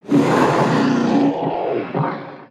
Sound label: Exotic Lizard Growl; creatures pets